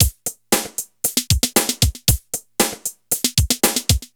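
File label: drum loops, right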